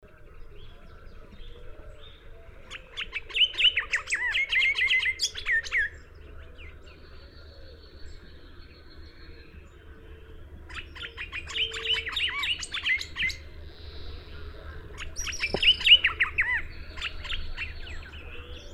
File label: Greyish saltator